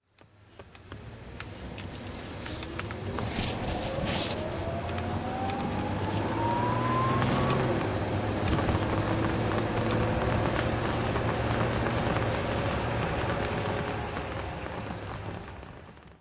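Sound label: jaguar xj6 42 drive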